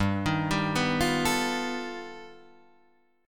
G Augmented 9th, Guitar, Open D

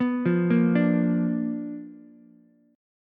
B Minor Major 7th Flat 5th Ukulele; arpeggiated; JGuitar

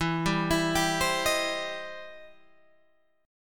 E Minor Major 7th Sharp 5th, Guitar, Open G; down

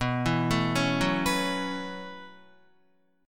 B 9th Suspended 4th B down Guitar; arpeggiated

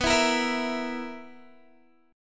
B Major 7th Suspended 4th B down Guitar; strummed

